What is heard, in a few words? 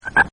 FROG; Nature Scenes; Frog Ambience; Partners In Rhyme